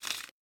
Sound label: mosaic unlock, ui; LG L-Fino